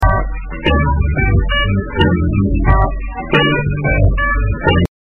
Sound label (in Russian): Мультяш спит – бибиби; id звуков в Роблокс